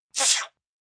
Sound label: Sneeze Ch, Sneeze, 1 child, spraying; Sneezing Sound Effects; VoiceBox; Twistedtracks